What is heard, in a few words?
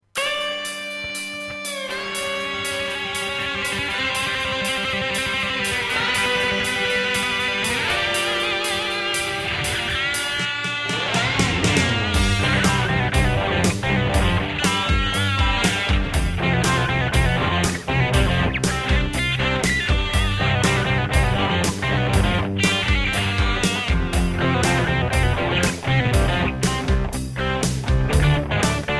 LTD EC-10 song VYPYR 30